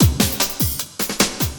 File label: LOOP, Drum & Bass, 150 BPM